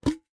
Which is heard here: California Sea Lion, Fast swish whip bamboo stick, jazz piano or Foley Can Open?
Foley Can Open